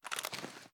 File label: rattle; tacz; daffas arsenal